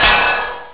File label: naginata; Ronin